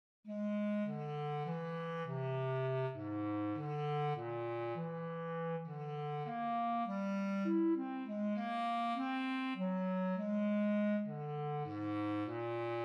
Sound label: melodic error detection; ear training